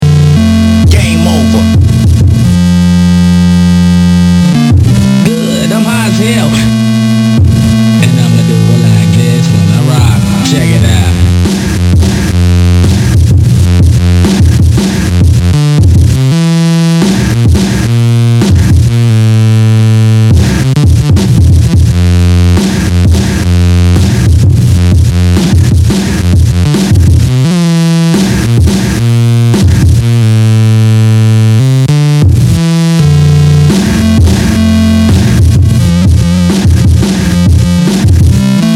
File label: STUTTER